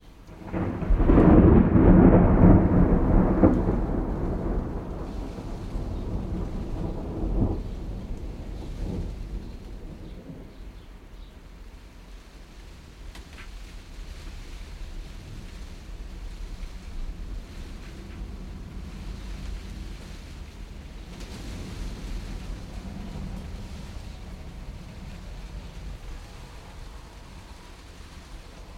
weather thunder crack followed by light rain increasing in intensity inside greenhouse